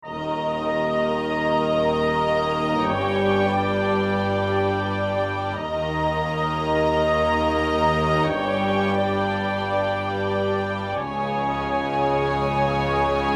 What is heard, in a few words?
Supernatural Grandeur Cue